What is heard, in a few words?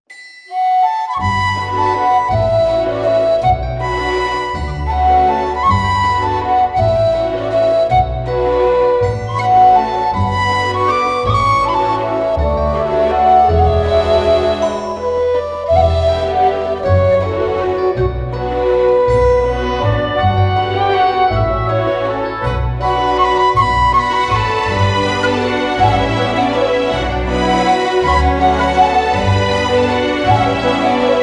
low whistle; fairy tale